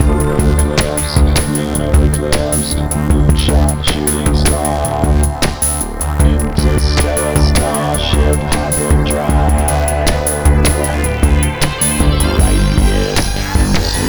music

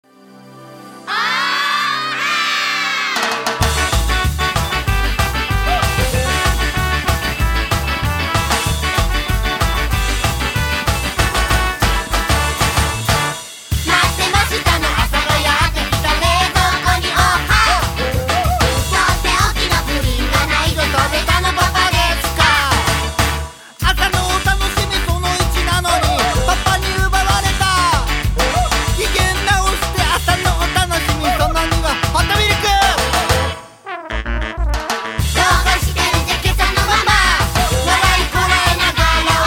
SKA; Dance Dance Revolution Oha Super Kids Station; DDR Oha Kids Superstation

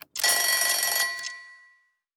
charge; Animation Throwdown; cardbabies

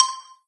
Combo Sound; Candy Crush Saga

